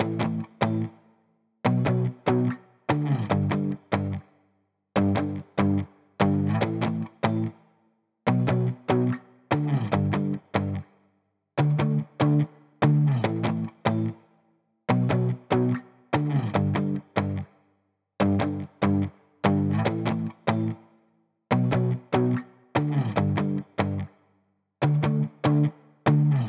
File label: 145 BPM D Maj Powerchords, Guitar; Loop Stems; Cymatics; Miami Guitar Pack - Pt 2; Shooting Star